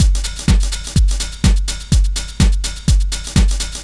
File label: DEEPHOUSE BEATS 125